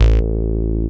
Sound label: DR. LOOP, BASS; X-Static Goldmine 3